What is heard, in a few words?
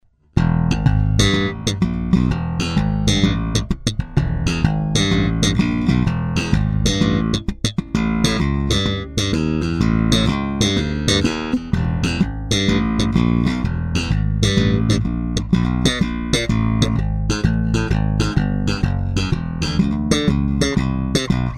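fender deluxe ativo slap, bass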